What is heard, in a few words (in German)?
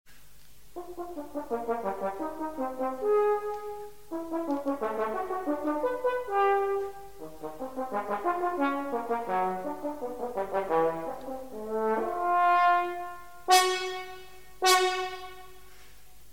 Drei Stuecke fuer Horn; Nr. 3